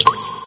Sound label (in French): goutte, ambience